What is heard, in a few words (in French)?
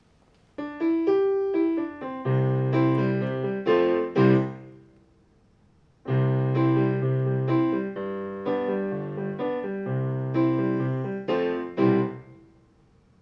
piano